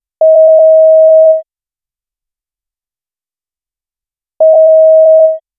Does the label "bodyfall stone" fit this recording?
No